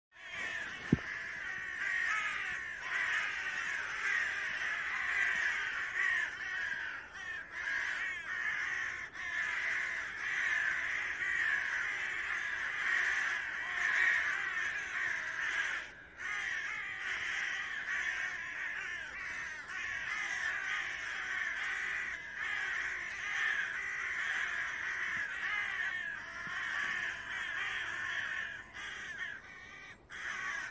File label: Corvus corone; SETE